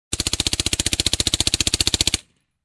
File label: Uzi firing long